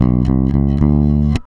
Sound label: REAL BASS, BASSLICKS; Ueberschall; Jam Box